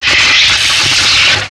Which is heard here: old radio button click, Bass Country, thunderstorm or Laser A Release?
Laser A Release